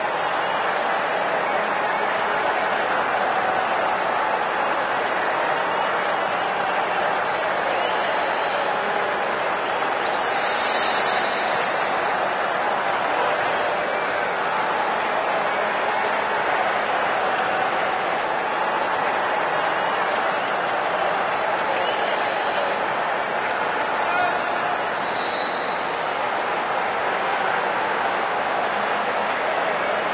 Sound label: music game; FoosBall